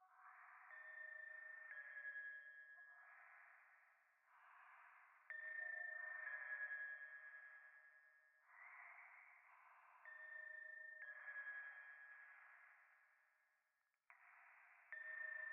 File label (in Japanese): ゴースト 右耳密着吐息 神社